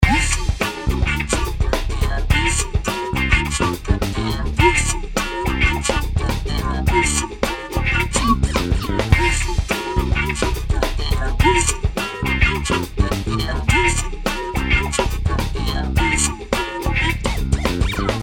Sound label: vocoder nature freq shift; els vocoder eiosis